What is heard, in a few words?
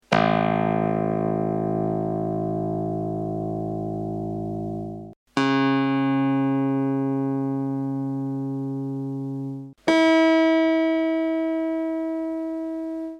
Clavicho, mellotron